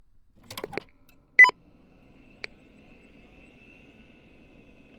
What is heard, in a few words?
nec pc9821ap u2